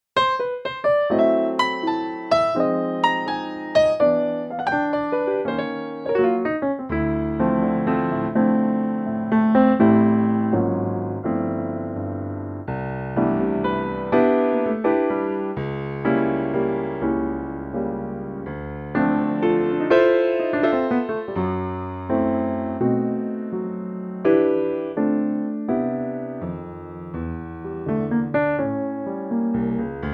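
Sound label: C Piano, male; key - C - vocal range - F# to A; Piano only arrangement; Piano only arrangement of this wonderful standard and at great tempo.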